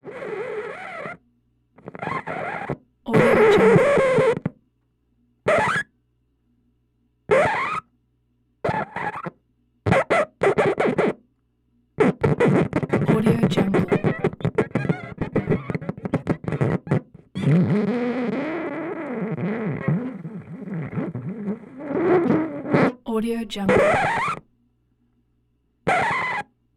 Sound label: record player ge wildcat portable needle slide